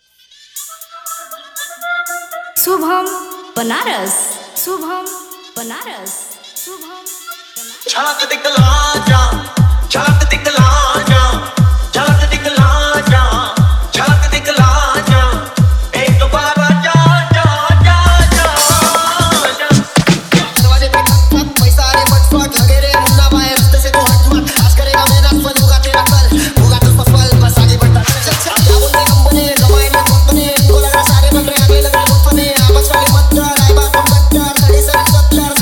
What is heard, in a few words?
Hard Dholki Bass Mixx